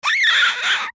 Monster Critter Baby Whine Cry; Creature; Horror; Animal; Monsters; Blastwave

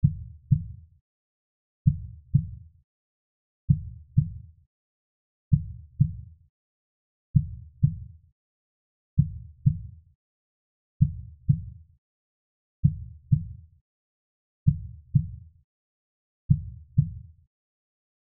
Heart Beat Slow, Heart Beat Slow Speed Human Loop; Hospital; Emergency

